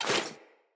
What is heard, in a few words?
Foley Action Default Melee Swipe